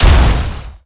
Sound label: Wall Hit